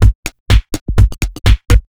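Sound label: Robo Groove C, drum samples dance electro; drum сэмплы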